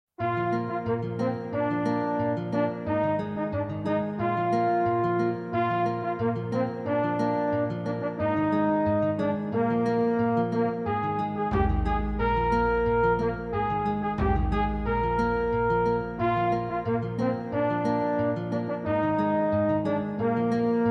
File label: Midi; minoc